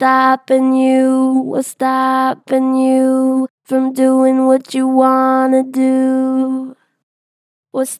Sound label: Str Flat Stopin you, Pitch Flat Strong, Vocals; Harmor Vocals